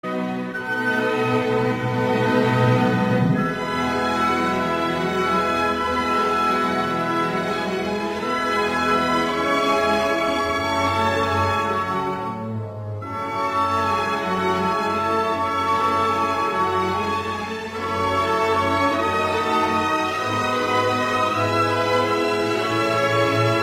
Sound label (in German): Morgenstimmung